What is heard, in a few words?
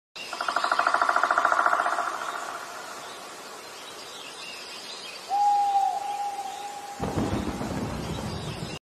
forest at night